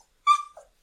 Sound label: Small Dog Cry